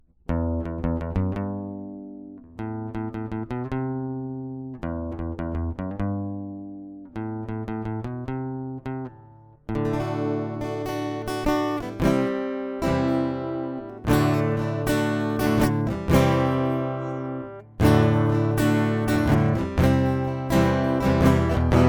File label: acoustic; MegaEpix